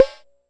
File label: Jomox MBrane, drum machines; Jomox MBrane 11